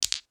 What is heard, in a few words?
marble collide